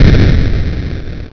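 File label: EXPLOSION; ABREED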